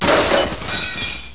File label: glass brk